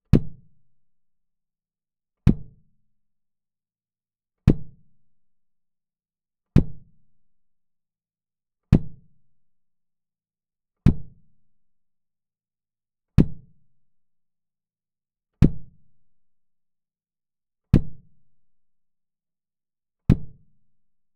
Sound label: Kick Closest 15dB Pad; （with LevelPad）キック内に10cm入れたもの